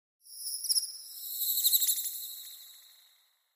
Bird High Warble; animals

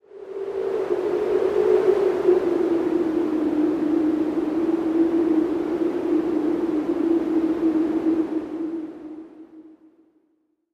wind howl, ambiences

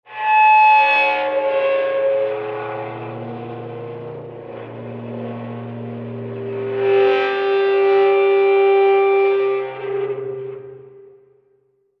Dry Ice Eerie Metal, dry ice

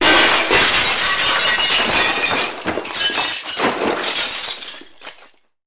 Glass Falls Heavy; windows & mirrors

